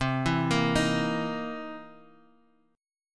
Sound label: C 7th Sharp 9th, Guitar, Half Step Down